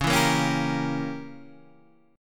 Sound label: C sharp Diminished 7th C sharp down Guitar Standard; C#dim7 strummed; JGuitar